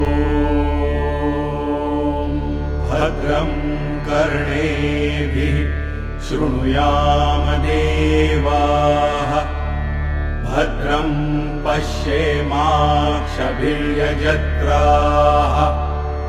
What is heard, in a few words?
Shaanti Mantra From The Mandukya Upanishad; Bhadram Karnebhi